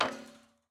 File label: Battery Put Down